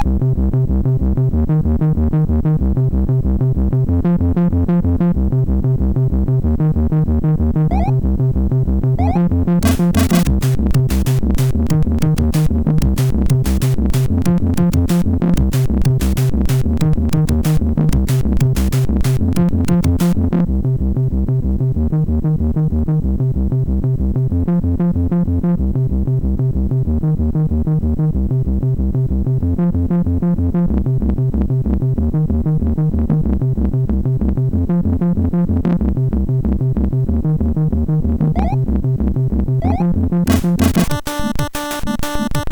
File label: Tekkno, MOS6581R4; hvsc